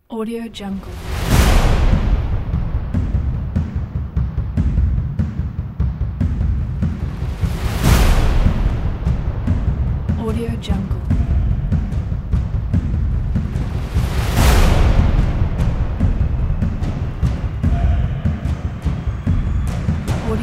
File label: chase action drums